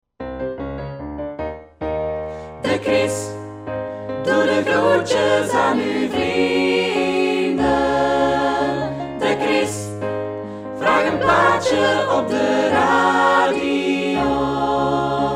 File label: Radio djingle